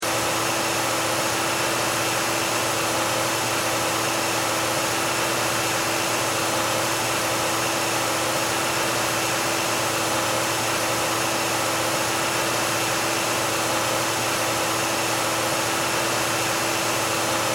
Car Mercury Sable Low Rpm Loop, Engine Loop; Power Car; Car Sound Effects

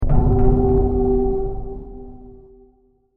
Sci Fi Hit With Heavy Reverb; Digital; Impacts

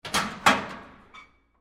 Locker Open, Sports, Locker, Door, Open; Miscellaneous Sport Sound Effects; BlastWave

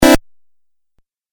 Beeps Video Game

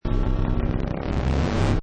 Space Scifi Sound Future Warning, Sci-fi danger; Core or Reactor meltdown. Emergency evacuation alarm, klax

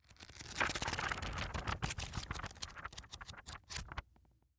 Textbook Flip Pages; Books; Offices; Blastwave; Foley

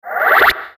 Sweeper Reverse Flange; Production Element Imaging Element Accent Transition; Sweeper Sound Effects

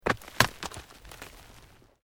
ROCK TUMBLING

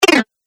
Arcade Game Insect Fall; Video Game Sound Effects; Retro Inspired Sound Effects - Think Nintendo, Atari, C64

